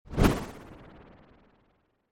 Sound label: zingy swish